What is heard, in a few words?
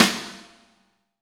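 Snare, Roland; Real Snrs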